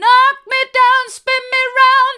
knock me down spin me round hard, vocal

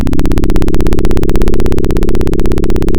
Sound of a plane taking off

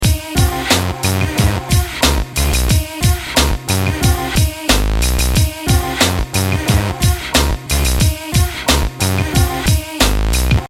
loop

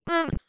fear moderate; VIVAE; vocaltrax

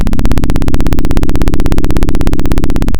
Sound of a plane taking off

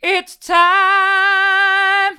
it's time hard, vocal

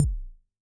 Kick, drum

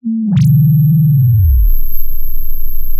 pop, Sound of an engine revving

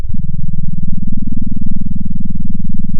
center, sound of a small motorcycle

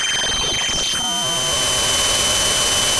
Sound of a cat meowing

